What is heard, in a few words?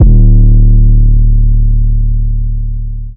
808 reso D; Hip-Hop; Tonal; Slate; CAICOS Hip-Hop Sample Pack